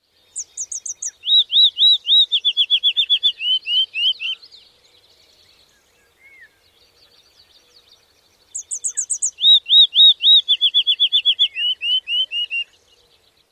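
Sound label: Field Sparrow dawn song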